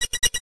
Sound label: Ui Scoretally Loop; Superb; Nickelodeon Super Brawl Universe; Android